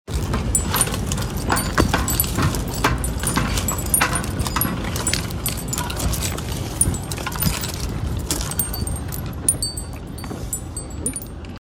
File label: Neighborhood Soundscape; summer institute 2026